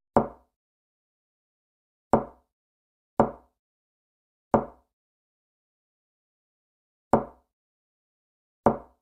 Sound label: put cup on table; Glass cup and Saucer; صدای استکان، فنجان و نعلبکی